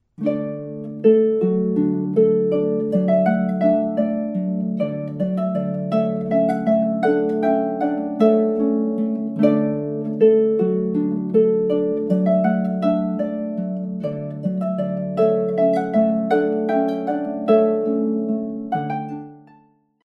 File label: minuet handel partial fade out